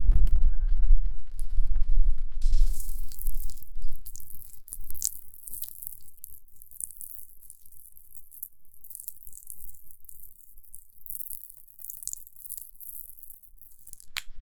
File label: the sound of slime jumpin, bouncy